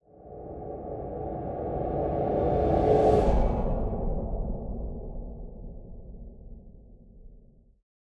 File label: Tunnel Riser